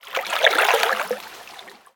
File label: lake; Level2 Ice Fields